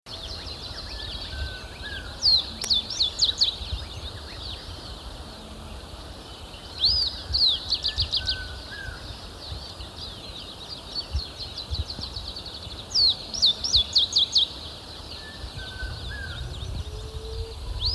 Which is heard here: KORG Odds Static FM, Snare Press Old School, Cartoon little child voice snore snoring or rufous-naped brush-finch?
rufous-naped brush-finch